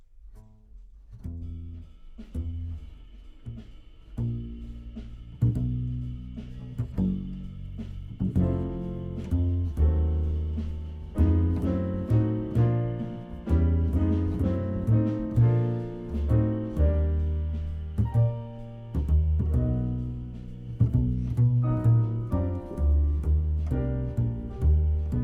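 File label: Contrabass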